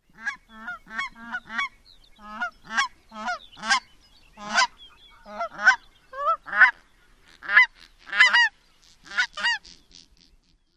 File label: flight of pair